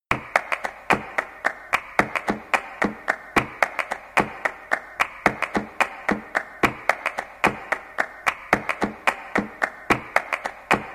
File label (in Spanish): PALMAS POR BULERIAS